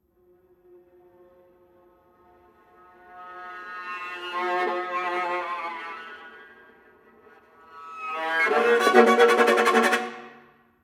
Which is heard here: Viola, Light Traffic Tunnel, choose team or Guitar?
Viola